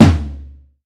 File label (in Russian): tom baraban v komnate